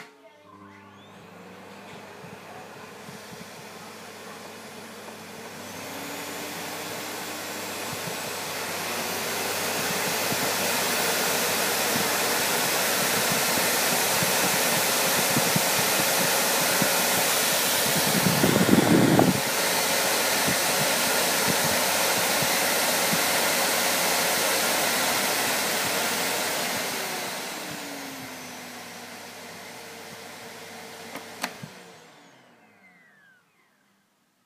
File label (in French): Aspirateur electrolux